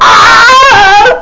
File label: BIG OW; MacAddict; Carmageddon Demo